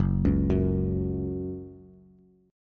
Gb 5th Gb down Bass; Gb5 arpeggiated; JGuitar

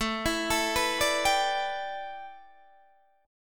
A 9th Suspended 4th Guitar Open D, chord; 19,19,19,17,17,17

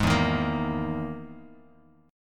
E-Major Sharp 11th E, Bass Standard; EM#11 strummed; 16,7,13,15; JGuitar

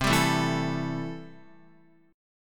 C 6th C down Guitar; strummed

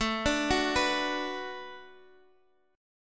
B Minor 7th A, chord

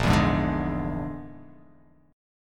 Bb 7th Flat 5th Bb; Bb7b5; strummed; JGuitar

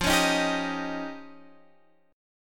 F 7th Suspended 2nd Sharp 5th, Guitar, Standard; F7sus2#5; strummed; JGuitar

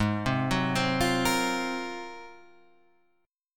G sharp 13th, Guitar, Standard; arpeggiated; JGuitar

